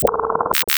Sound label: combine button locked; buttons